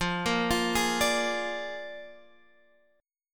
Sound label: A sharp 7th F, Guitar